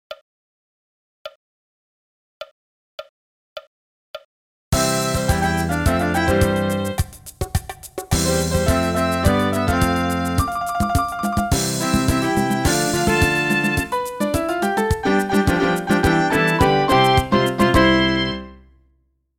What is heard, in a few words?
MIDI; NHK Opening